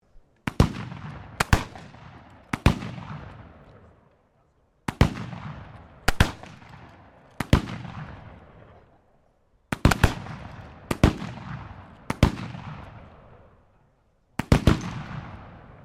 RIFLES BLACK POWDER REPEATERS TARGET SHOOTING SEQUENCE, Black Powder firearms at a shooting range